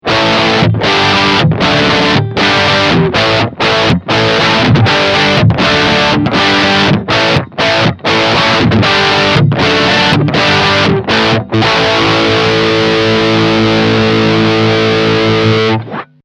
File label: Overdrive